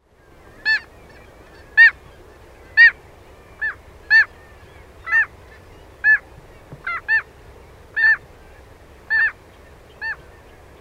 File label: kittiwake